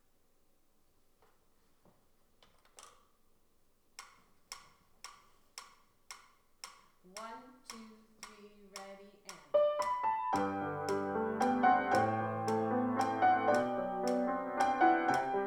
A World of Peace Duet

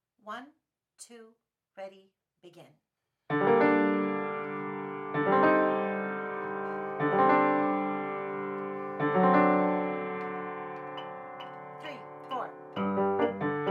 Rumba; Secondo

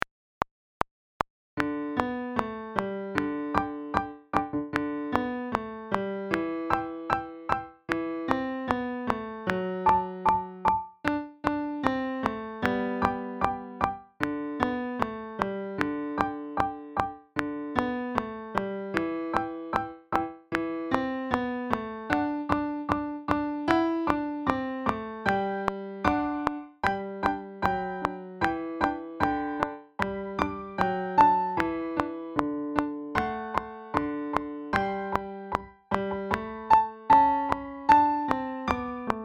Jingle Bells

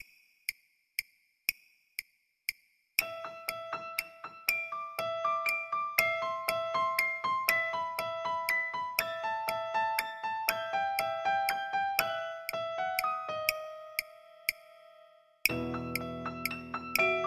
Ukrainian Bell Carol practice

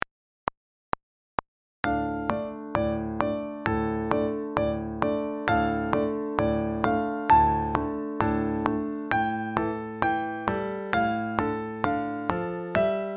Drum Circle

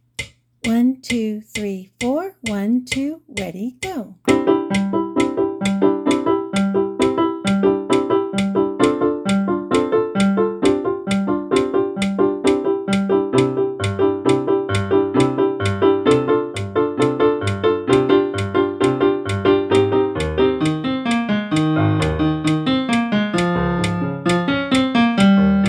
Duet Fantasy Jingle Bells SECONDO

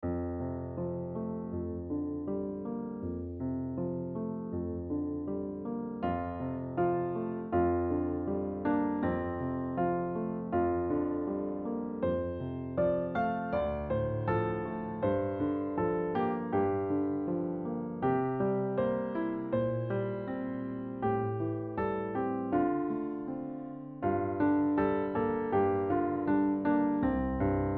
Chinese Lullaby

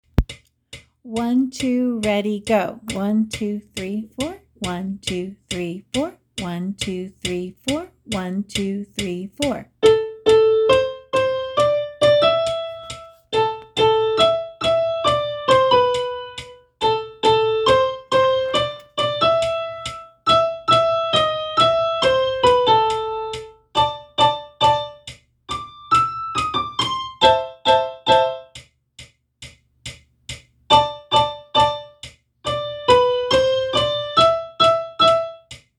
Knock Knock Knock! PRIMO

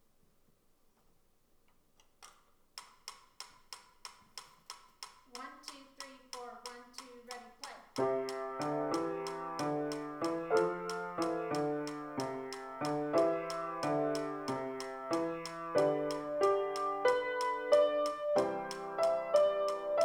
Duet

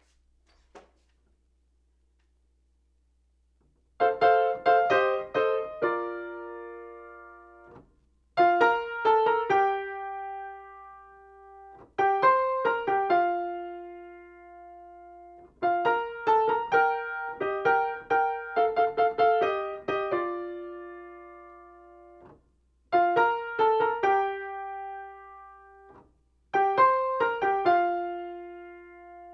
primo no metronome

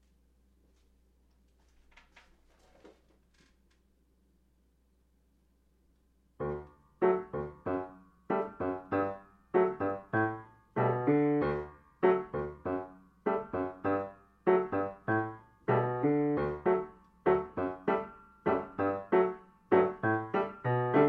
sec no metronome

